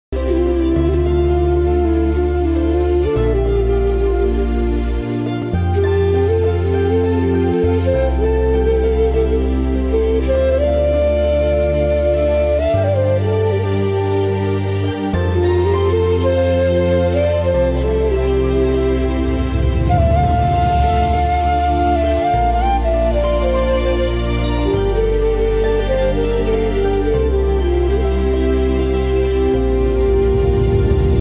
movie ringtone phone ringtone; raja vikramarka

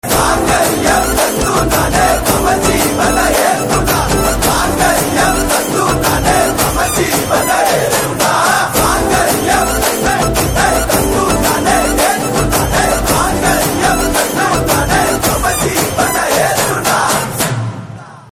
Ringtone; Eeswaran

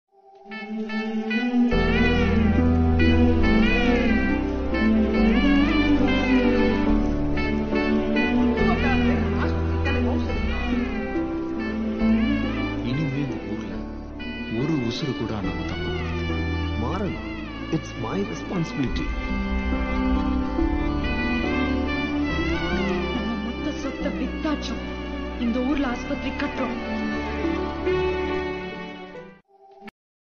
sad bgm; mersal; adhirindi

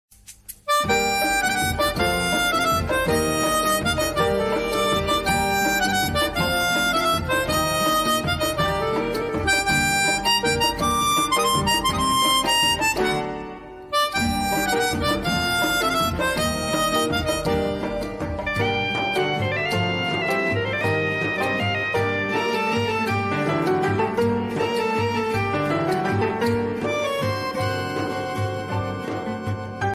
instrumental ringtone

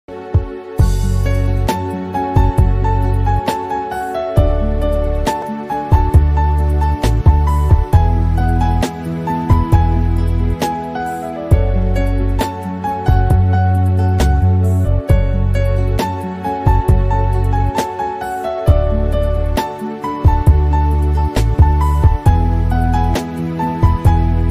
christian ringtone; jesus

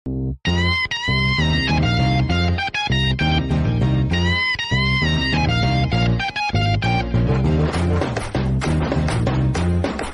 mass ringtone bgm ringtone

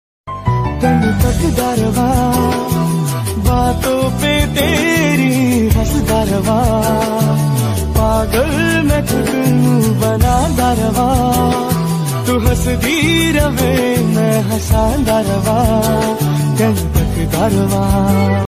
new marathi ringtone marathi ringing tone

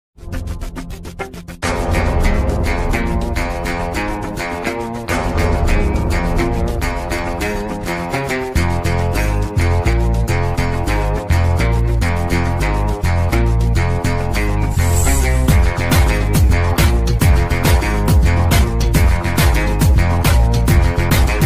telugu mass ringtone; billa

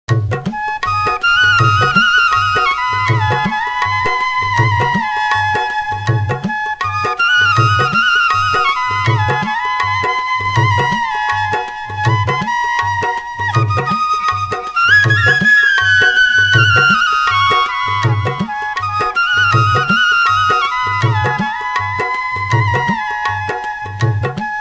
flute bansuri romantic; heropanti

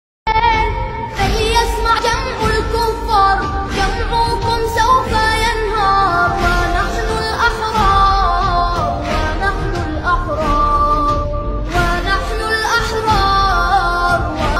ahwarun ahwarun ringtone islamic ringtone

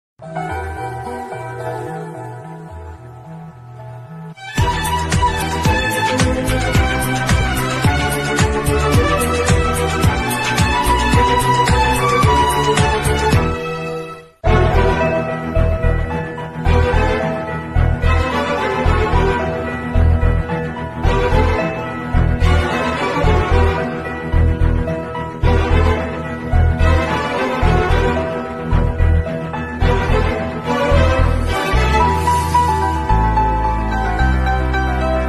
love bgm ringtone

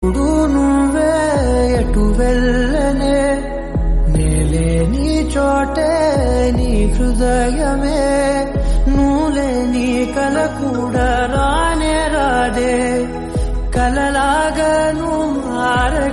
Telugu Song

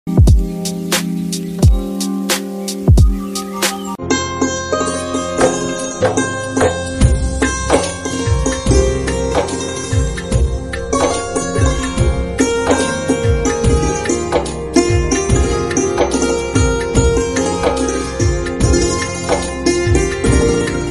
instrumental ringtone devotional ringtone; shiya ram

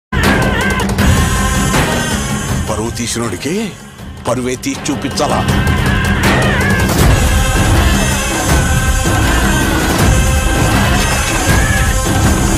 ambajipeta marriage band new bgm ringtone

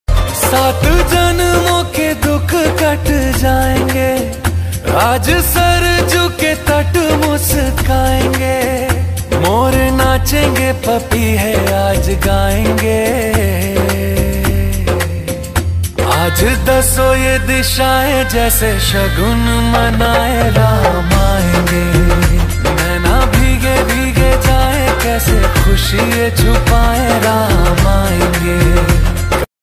bhajan ringtone; ram bhajan

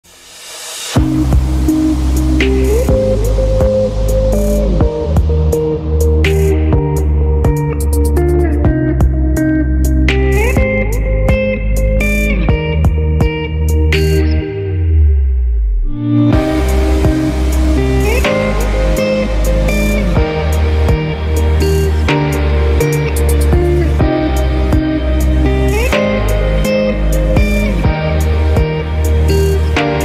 instrumental music; goblin

